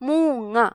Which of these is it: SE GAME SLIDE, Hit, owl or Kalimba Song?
owl